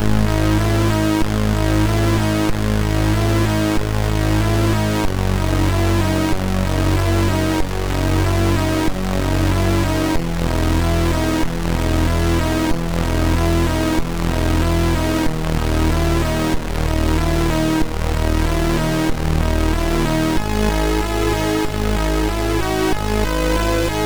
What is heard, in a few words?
Slow n Cool, MOS6581R2; sid